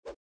whoosh spear

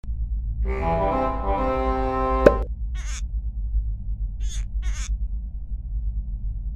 Plunger Boots; Fleeing The Comples

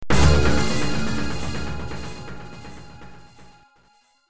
Round Over; Mortal Kombat; arcade 1992; Throne Room Round Over

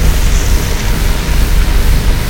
Weapons Shock Rifle

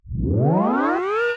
Tape Start

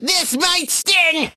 Demon Tridents Taunt

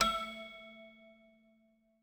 toy piano

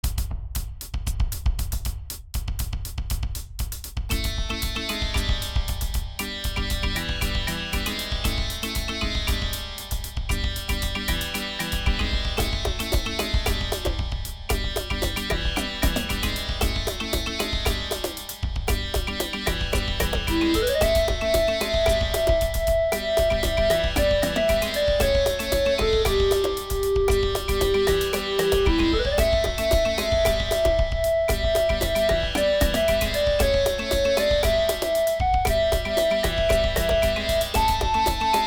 Drums; Cosmo's Cosmic Adventure; reworked midi soundtrack

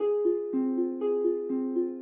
Harp

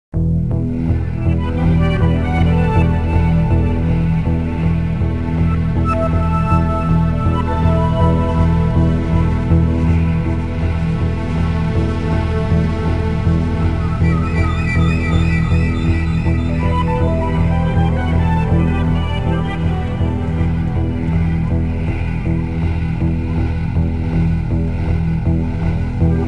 Cave; carmen sandiego math detective

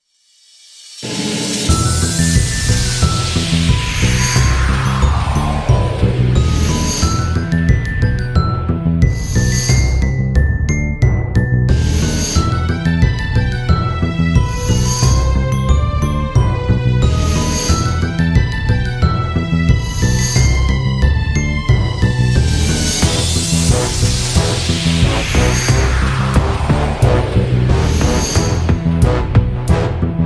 Midnight (Instrumental)

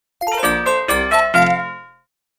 Minigame Complete

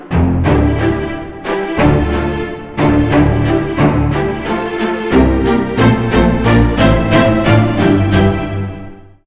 String; The Bureau XCOM Declassified

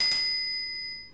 food ready; SpongeBob Diner Dash ios gamerip

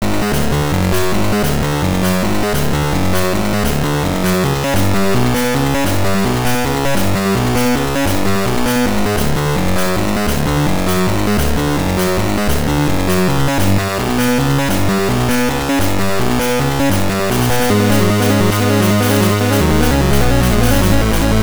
Boss Fight

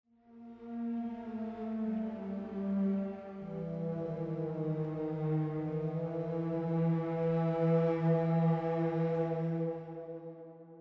Main Melody Bass and Pads

